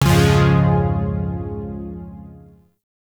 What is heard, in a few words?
Shawzin Phrygian Chord F; Chords; Warframe; Zariman